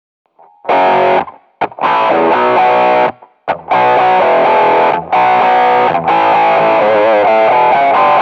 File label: Intro Guitar